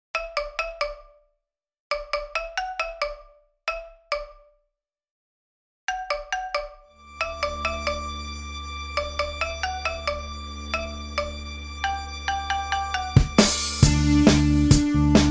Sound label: 136 BPM Key of D